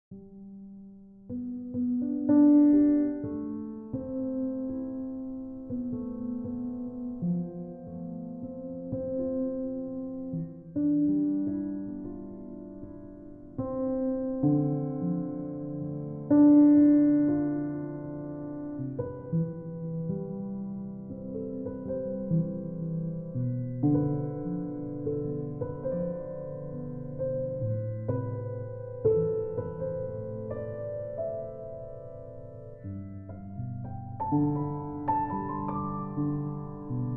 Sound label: Zen Piano